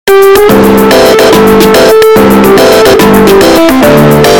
bass boost

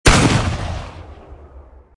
shotguns

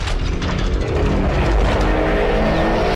speaker charge; titan

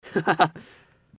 laugh

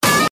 pipe pitch

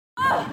grunt

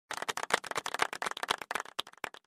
golf clap

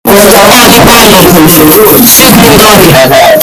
bass boosted

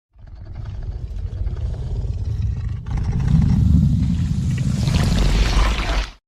wendigo roar

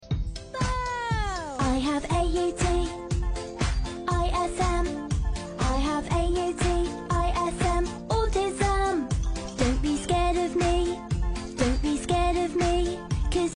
rap

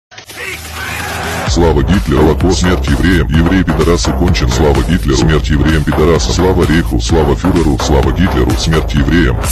dabstep

